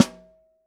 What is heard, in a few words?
SN Top, Snare